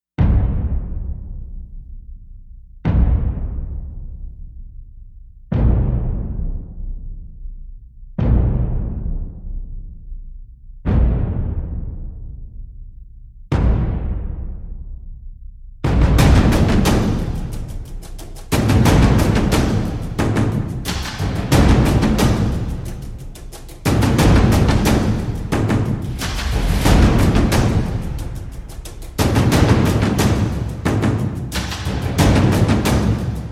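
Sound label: percussion only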